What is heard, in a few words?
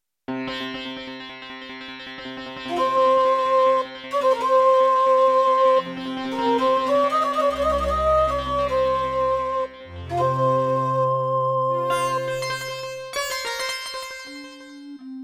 Miditemp DoX-1; Prince of Persia 2 - Intro